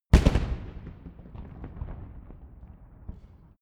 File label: rifle salute fire